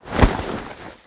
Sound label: pad; Mafie 1